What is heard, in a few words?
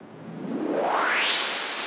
128BPM Noise 1Bar, Clean Noise Ramps; FX Fills; Vengeance Samples